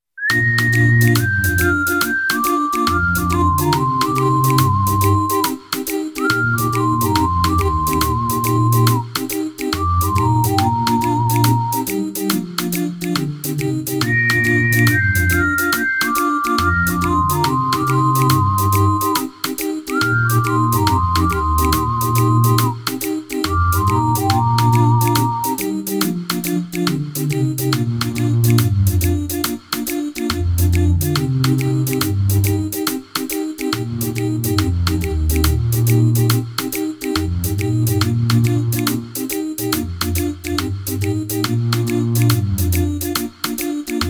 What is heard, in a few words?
brass minus